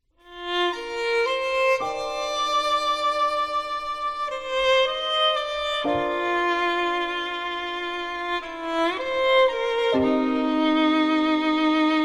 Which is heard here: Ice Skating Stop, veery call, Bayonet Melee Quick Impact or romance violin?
romance violin